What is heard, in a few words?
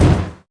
Impact